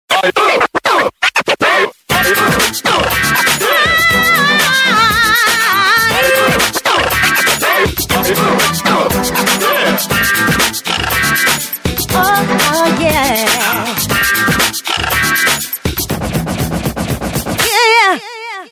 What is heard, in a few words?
Ringtone; Samsung GT-S3500H